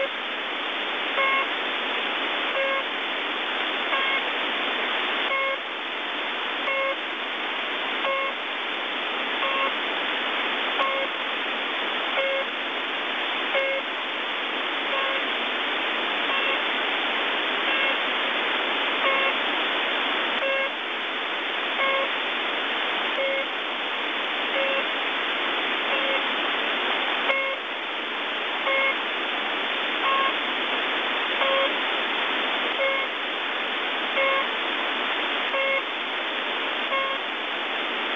Radio Beep; SFX

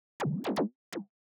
Laser

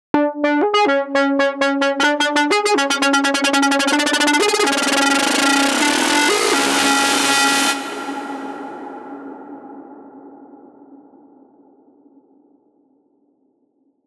Synth Seizure; SFX

